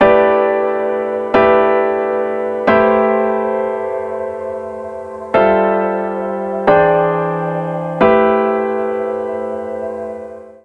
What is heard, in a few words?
Soulful Chords CMaj(AMin) 90 BPM; ultimate vibes midi pack 2